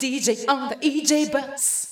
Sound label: VOICE GRL HOUSE 125 A, House Voice